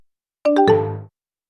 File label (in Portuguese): alert